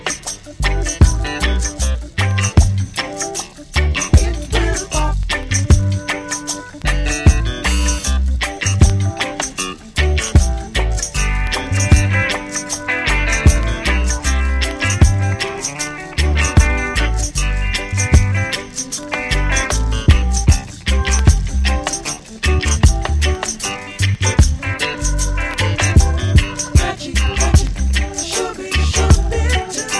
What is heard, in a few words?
reggae